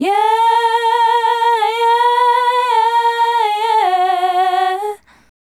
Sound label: Female Group, Hip-Hop and RnB Vocals